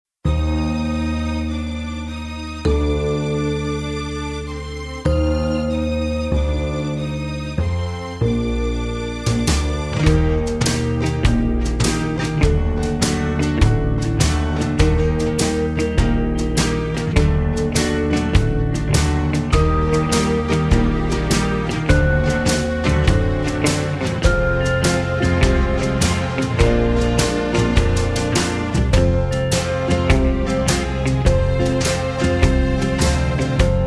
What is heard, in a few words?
(Eb) No Backing Vocals